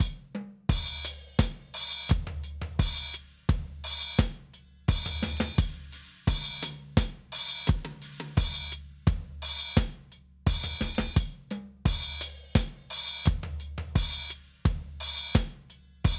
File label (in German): Modern Drummer original nervige HiHats; Metric Halo v4